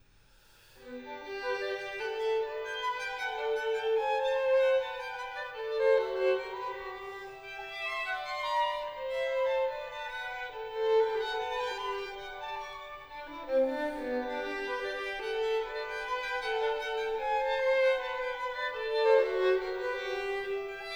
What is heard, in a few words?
String Quartet in C major; Joseph Haydn; String Quartets, op. 76